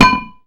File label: metal plate